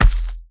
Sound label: Footsteps Dirt Run; The Town